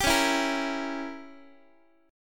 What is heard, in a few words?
C sharp Diminished 7th C sharp, Guitar; strummed; chord; x,x,10,11,8,9; JGuitar